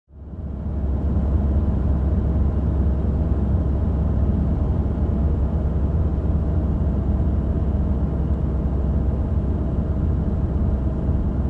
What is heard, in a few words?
Parking car from inside